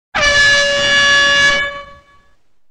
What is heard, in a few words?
Sports Air Horn; Joyish Grogger; Purim